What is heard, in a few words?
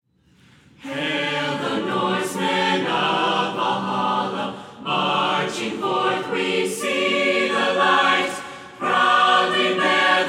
all voices